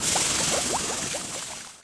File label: droid melt; Warzone